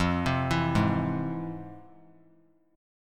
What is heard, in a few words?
F Minor Major 7th; FmM7 arpeggiated; 17,19,19,18; 3C,3F,3A,3D